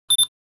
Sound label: Digital camera beep blip autofocus